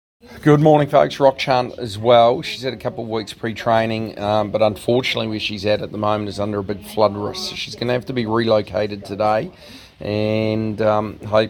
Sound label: Rock Chant voice note